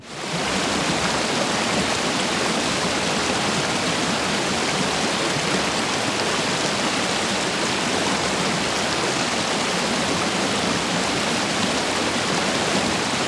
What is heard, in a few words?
ship engines; World Of Warships